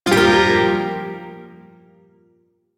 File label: Shawzin Phrygian Chord; DE; Warframe; Duviri Shawzin